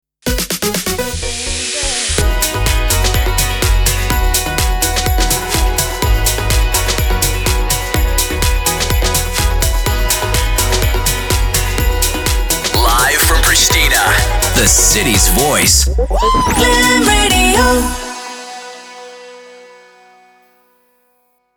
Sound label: Sung Jingle Radio Bmin 125bpm Ramp; Glam Radio